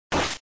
get hit; Chibi Knight

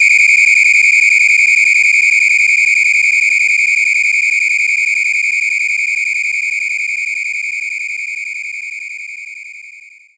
Whistle Short; knights try

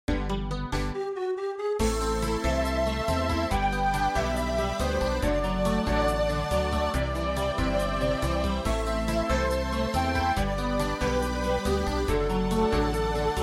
Midi Field; Shining Plume 2 Windows gamerip 2017

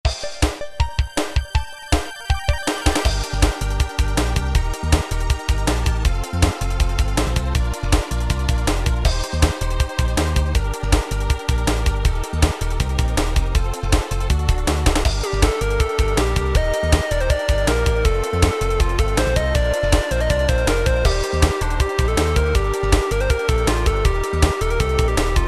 Game Combat Bramble; backpack hero gamerip 2022

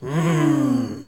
done eating; SpongeBob Diner Dash

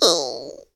frustration; Scrat

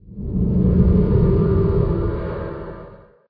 Ambient

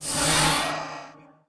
Cast Charge; New The Denpa Men

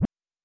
Jump

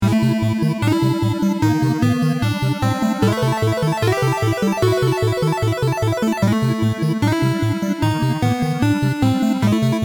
bgm dungeon; Toolkit of the Kingdom; Asobox; android game music

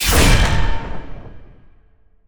Attack; Yu-Gi-Oh! Master Duel

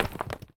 dirt; ice age scrats nutty adventure

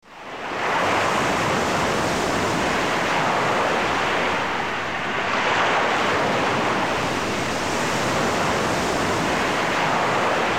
AMB BEACH; Wario

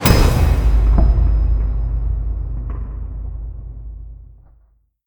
Sound Effect End Turn; Aztez

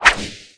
Airslash

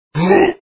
evil grunt; Dad 'n Me

